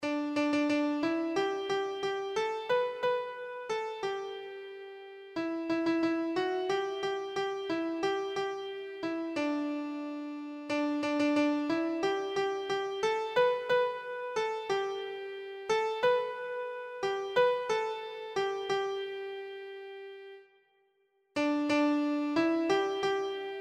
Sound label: do lord, keyboard